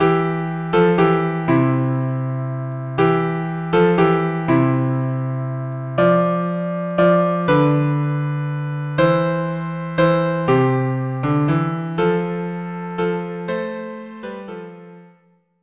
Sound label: violin cello; Silent Night